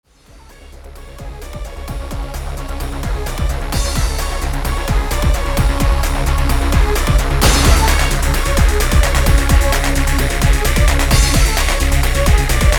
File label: big beat thingy